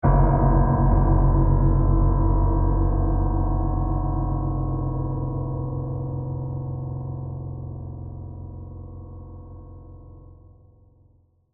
Piano Stab Low, Music, Dark; Musical; BlastWave

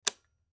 Switch Off Device Light Wall; Switches; Foley; Blastwave

